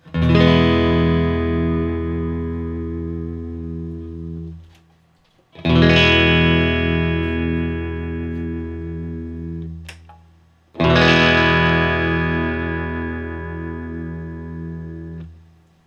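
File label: Guild X500T Open E, guitar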